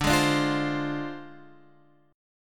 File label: D Minor 7th Flat 5th